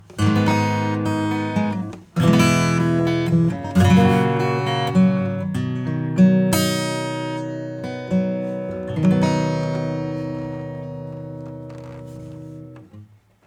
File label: acoustic